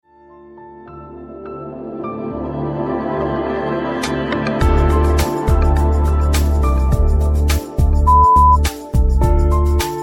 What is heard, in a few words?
Chill Out